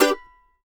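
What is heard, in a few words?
mandolin G major, Country; Sampleheads; Whole Lotta Country 1 & 2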